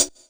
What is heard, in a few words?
Korg M1 Closed Hi Hat